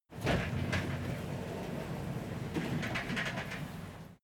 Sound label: train subway door open